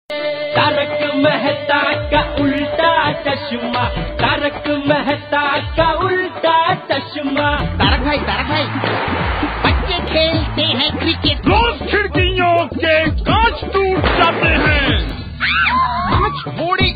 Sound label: Indian Ringtone; Taarak Mehta Sab Tv